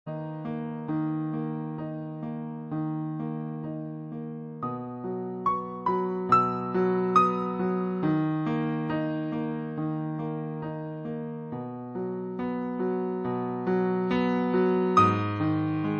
Piano Version

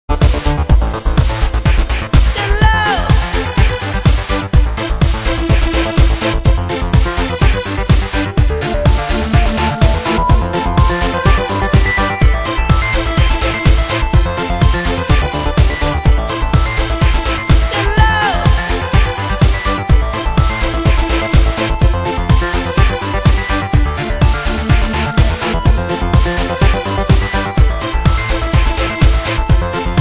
Dream n Trance

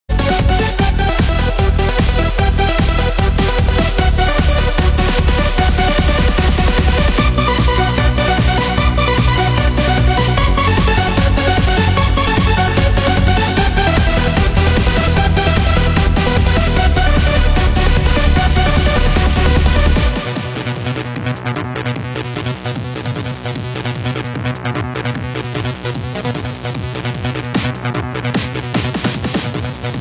A Short Dance

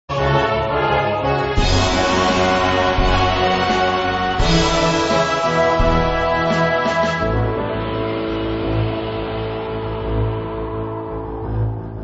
Orchestral; Spellbound

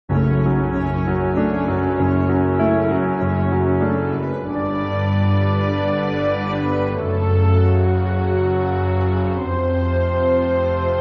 piano and orchestra mix; Nautilus

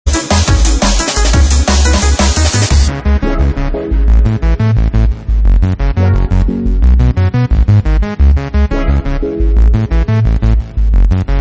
Instrumental Version; Spy vs Spy

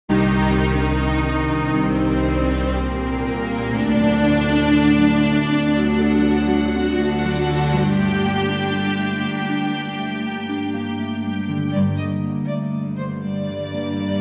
Orchestra for a Cello; Armageddon Man

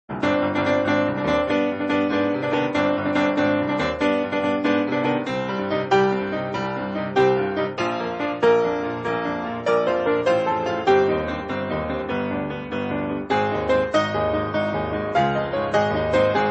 Pool of Radiance (grand piano)

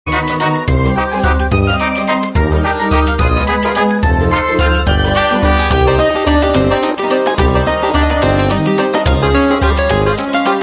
Waltz

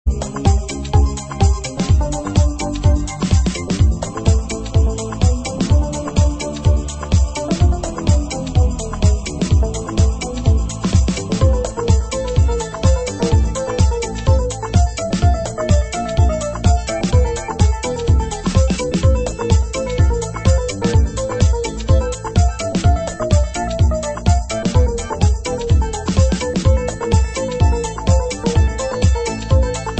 Dance; Commando

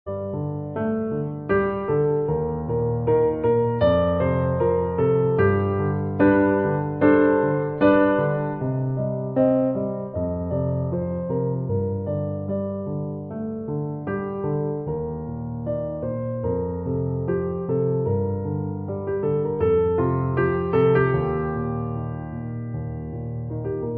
Variations on a piano

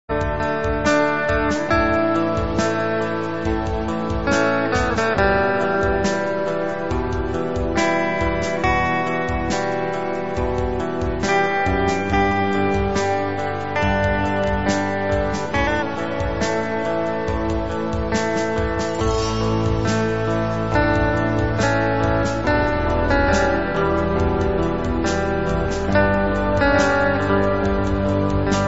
Romantic River Epic; Dragons Lair 2

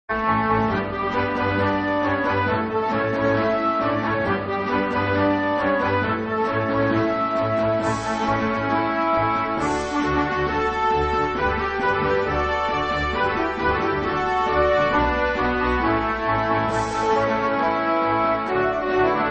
orchestra version; Falcon Patrol II

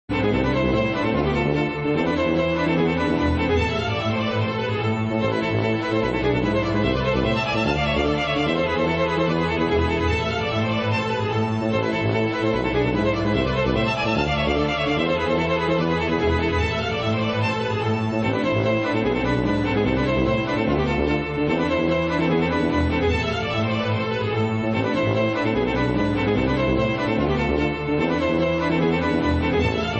Orchestral; Commando 86

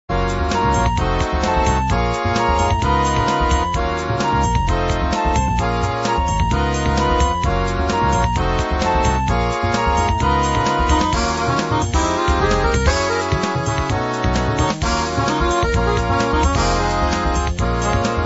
Acid Jazz Accordion remix

